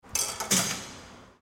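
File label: sounds of turnstile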